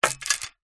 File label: chain thick hanging section swing into wooden post; zapsplat